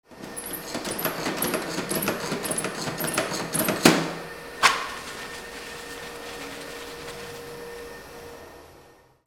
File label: BATHROOM